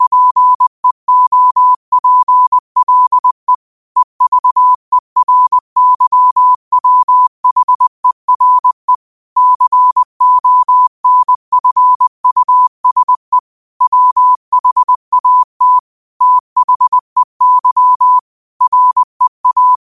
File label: 5 wpm, morse